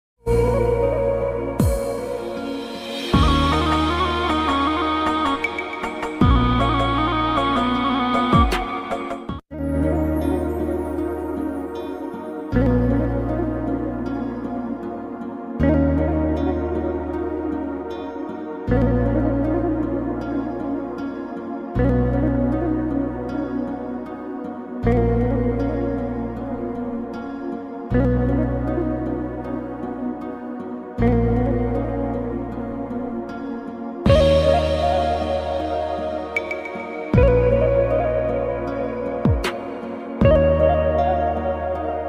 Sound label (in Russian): грустная музыка